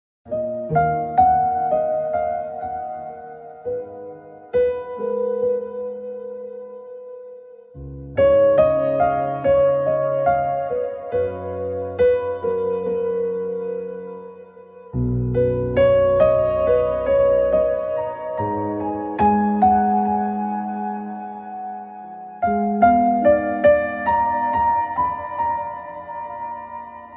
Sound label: Sad Ringtone for iPhone